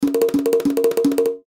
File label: Hand Drums Pitter, Hand Drums Pitter Patter; Cartoon; Musical